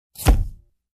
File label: Cameras